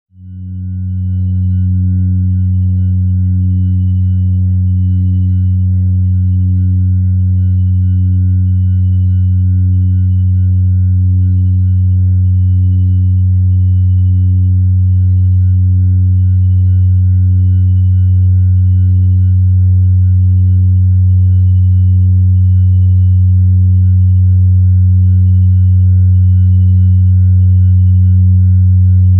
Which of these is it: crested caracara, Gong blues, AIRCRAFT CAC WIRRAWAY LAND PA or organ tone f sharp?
organ tone f sharp